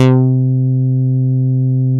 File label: MOOG, Source Bass